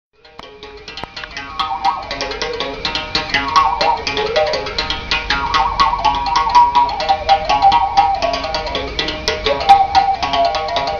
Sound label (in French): arc musical; instruments